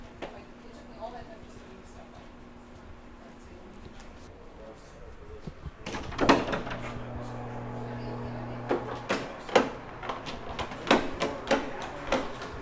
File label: Hospital Hall With Electronic Door Open